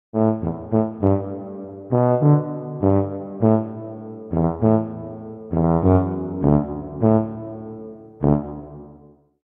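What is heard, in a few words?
sounds of tuba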